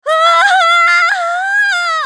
死亡; グレイス

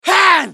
攻撃; パンファルロ

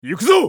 移動; ナル

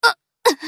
ウィザード女･ダウン

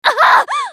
スカウト女 被ダメージ

